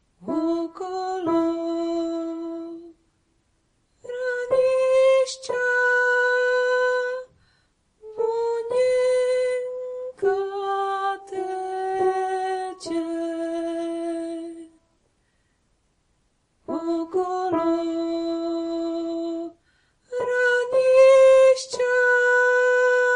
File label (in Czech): okolo soprán; Okolo Hradišťa